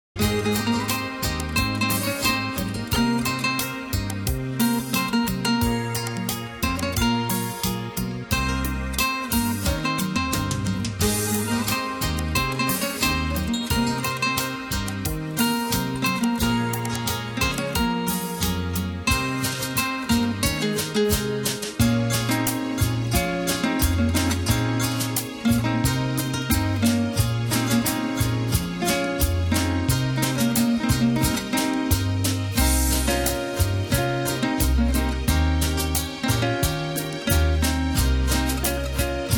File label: instrumental